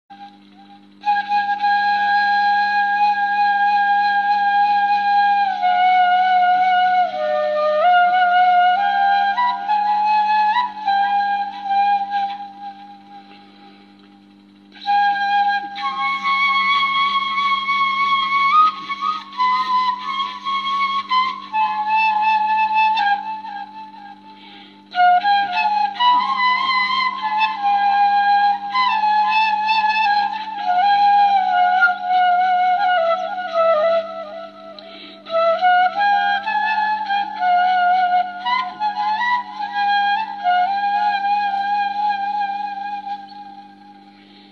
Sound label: flute